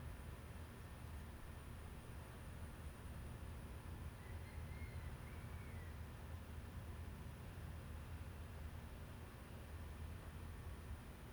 ambience evening, distant rooster calls, car horns, some insects and wind in microphones; Omar Alvarado; Minimal ambiences